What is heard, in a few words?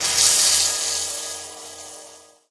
lightbridge elec, footsteps